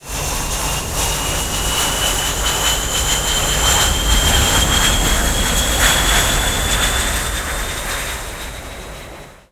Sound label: dropship evasive, ambience; Quake 4 Alpha